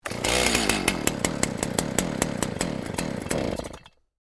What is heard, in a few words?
Chainsaw Run Revs, Industry, Chainsaw, Start, Stall, Stihl, Ms310; Blast Wave